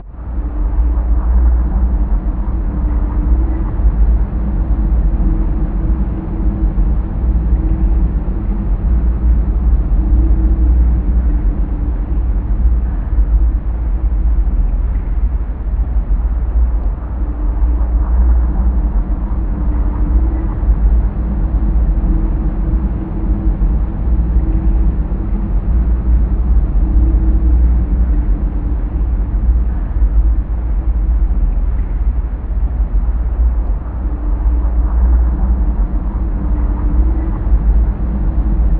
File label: drone; Ruined Garden